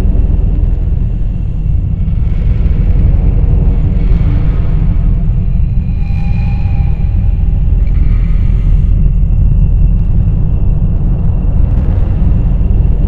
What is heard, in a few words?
Rumble Loop